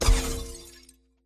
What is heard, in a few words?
Badge Break